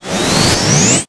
beginspell

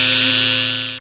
magneticfield

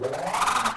arclouse attack; free 2 play

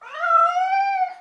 hiss

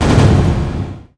hunter landmine; classicro

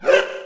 die; dokebi

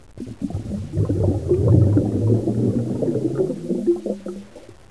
in water; classicro

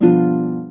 sign up; classicro

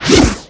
soulstrike; classicro

